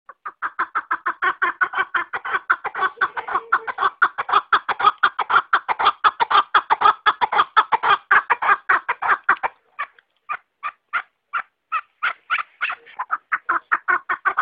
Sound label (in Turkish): keklik otusu